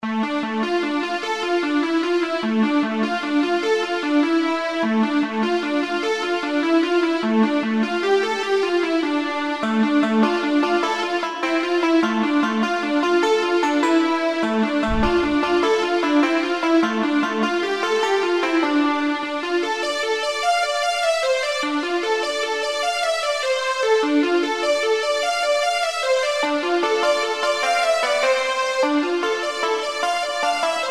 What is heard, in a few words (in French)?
Jig des cormorans